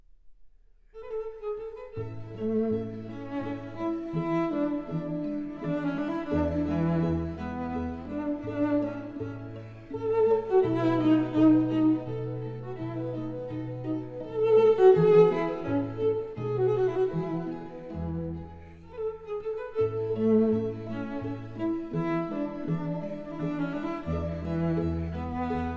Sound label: String Quintet in E Major, Menuetto; Vivaldi; Boccherini; Cello Concertos